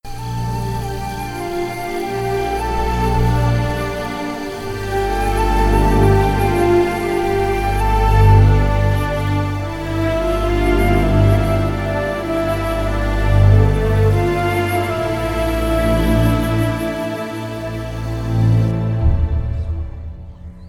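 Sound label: Dog Died background music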